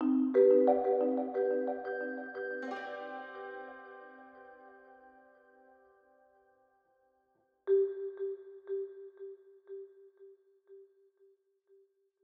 spoonsisland intro head 90bpm CminBbmin 12beats

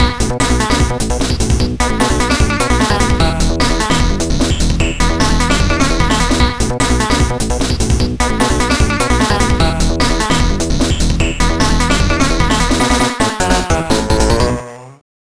Motorway; lotus iii; amiga

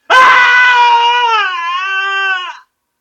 Soldier Scream

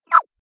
Safe Feedback Right

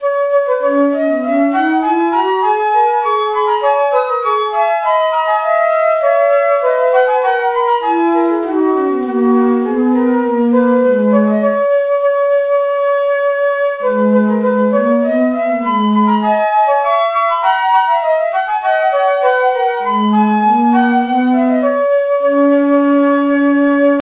Carack Flute; the bards tale remastered trilogy; Zanduvar

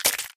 Scorpion Crush

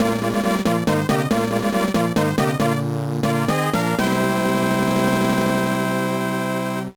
Big Boss Clear; Super Mario Advance 3; Super Mario World; Yoshi's Island

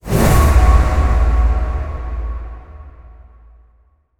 light orb close

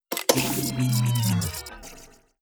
lever

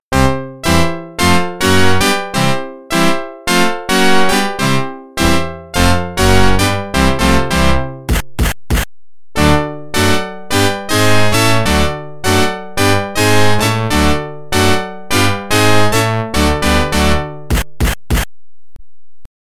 Organ; NHL 94

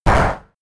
Hit Weak; attack moves; Pokemon; Gen 5; Blk Wht Blk2 Wht2

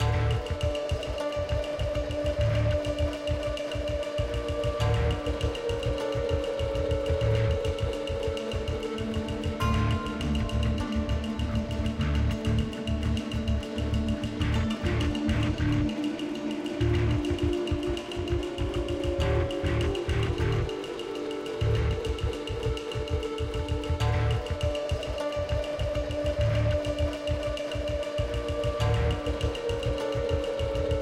Combat Low Evade

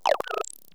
Creatuer

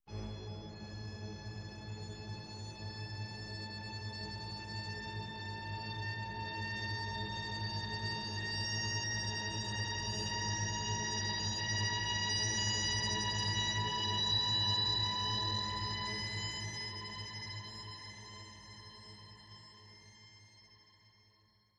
Monster, Tension; Silent Hill Downpour

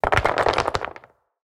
Combat Blocks

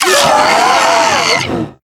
Jump Scare Vocal; The Inn; Zara

